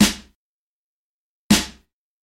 Early Reflections

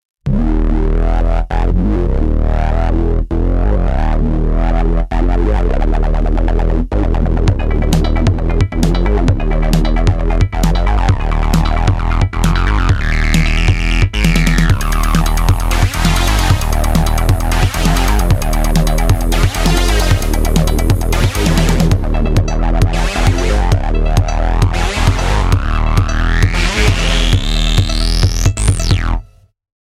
UNO Synth Acid; ik multimedia uno synth; سینتی سایزر و سکوئنسر iK Multimedia Uno Synth

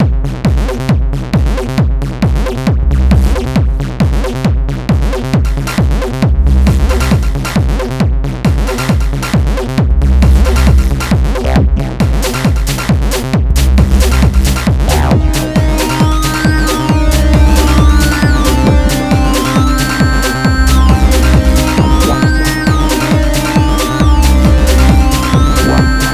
Arturia MicroFreak AEternal Blush Response; سینتی-سایزر

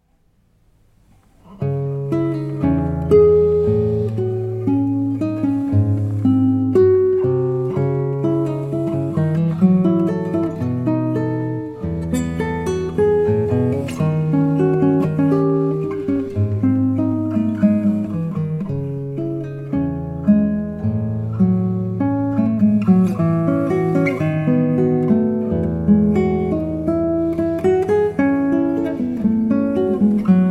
Classical Guitar; se electronics rn17 stereo pair; میکروفون استودیویی و لامپی اس ای الکترونیکس RN17 Stereo Pair

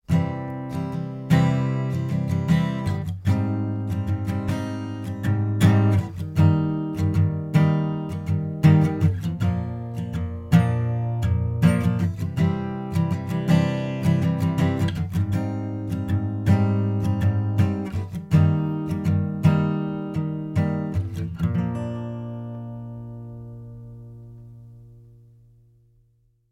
Acoustic Guitar Strumming

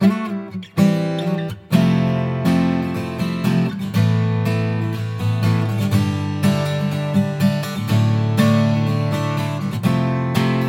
Strumming Processed; se electronics vr2; میکروفون استودیویی و حرفه ای اس ای الکترونیکس VR2

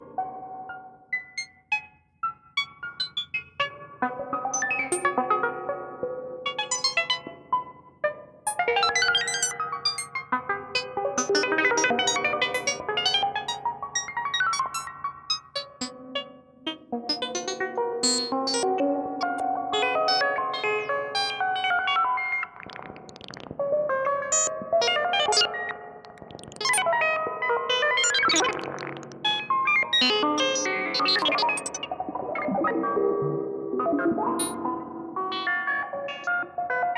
Grandmother Moog Robotic; Subotnik

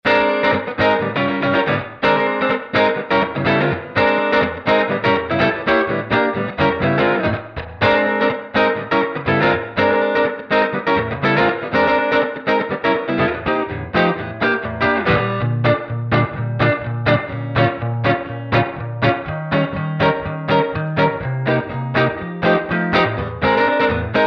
Rockabilly ’59 Tweed Deluxe with 1x12 Tweed Lux; Cond 414

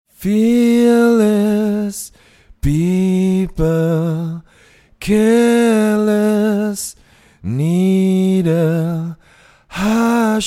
Vocal Male; se electronics 4400a; میکروفون استودیویی و حرفه ای اس ای الکترونیکس 4400a

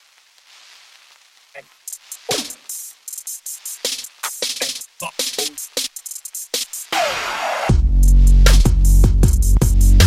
UNO Synth Urban; ik multimedia uno synth; سینتی سایزر و سکوئنسر iK Multimedia Uno Synth

